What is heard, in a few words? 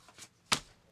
Slap Cards